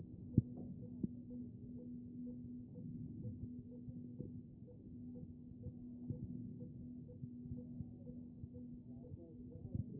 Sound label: A CHEST; B MODE